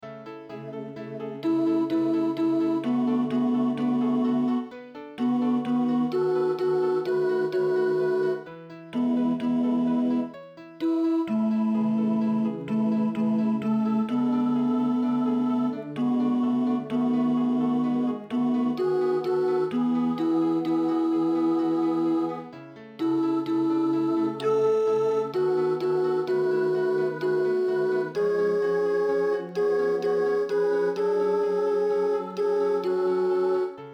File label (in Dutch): Met aarde toegedekt alt